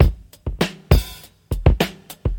134 bpm modern breakbeat g sharp key, Free drum loop - kick tuned to the G# note